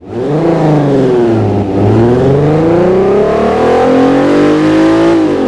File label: first, vehicles